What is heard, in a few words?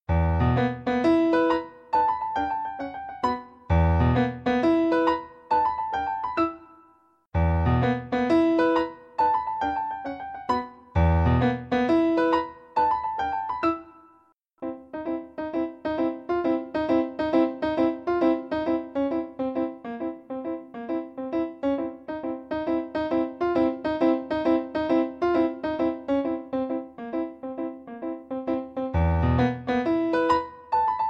piano music